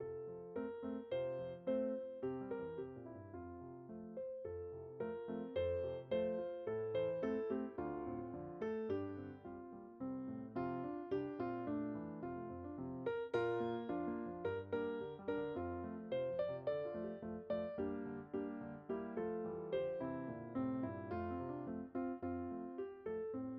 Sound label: Cau Cho Cha Me Piano; Vietnamese Arrangements